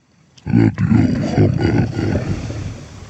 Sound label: jingiel; musician